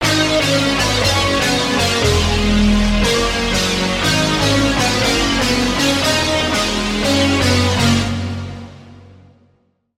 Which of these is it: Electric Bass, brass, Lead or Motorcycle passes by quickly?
Lead